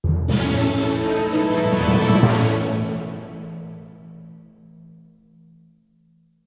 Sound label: win